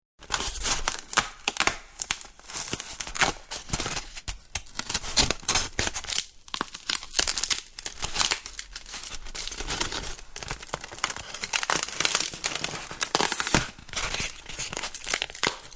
Polystyrene, Handling, breaking and snapping polystyrene